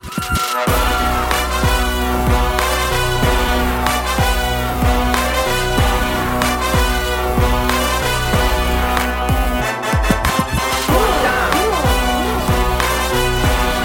Tamil Bgm; Sketch

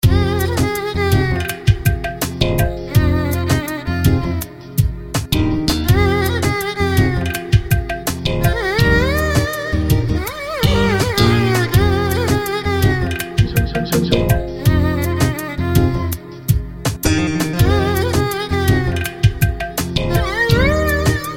Awesome Violin

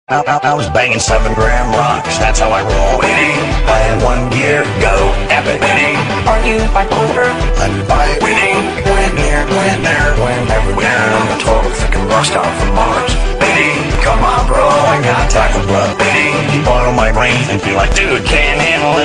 winning ringtone

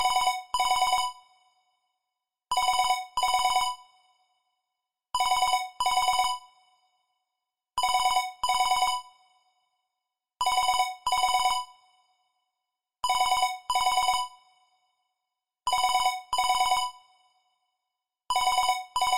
Business Ring Tone

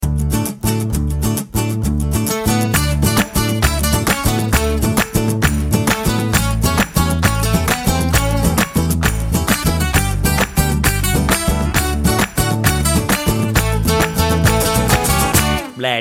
Villu Music

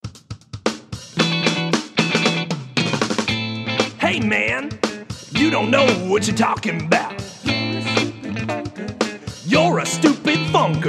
Stupid Funker, Funny

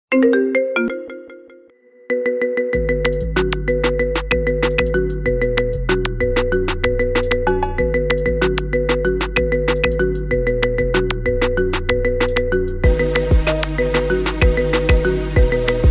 iPhone 11 pro Wobble Up

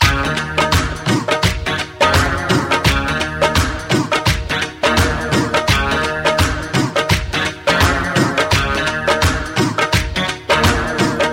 Hindi Love Guitar

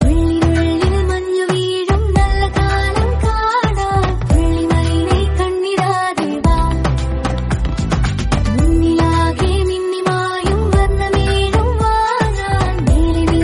Malayalam song; Kumari

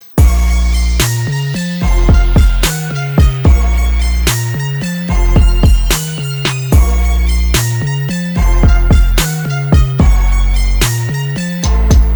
instrumental

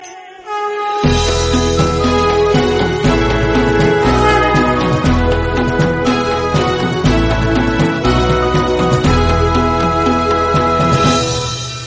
Sri Lanka Traditional Music

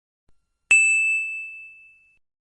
Iphone 14 Sound Message Tone

